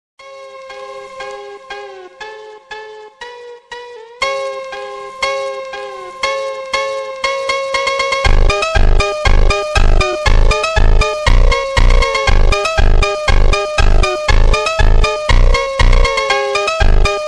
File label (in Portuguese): Slowed Ringtone